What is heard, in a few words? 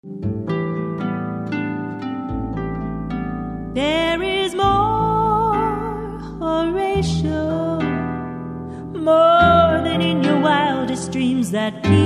Laugh